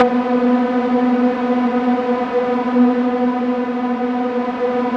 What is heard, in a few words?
B3, Yamaha VP-1; Music Store